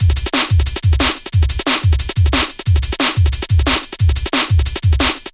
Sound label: Future Beat, Drum & Bass; Fluid Dynamics